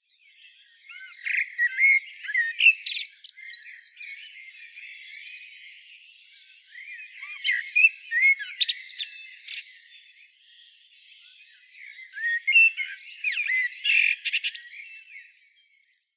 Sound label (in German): Amsel Turdus merula; vogelstimmen; ROSINA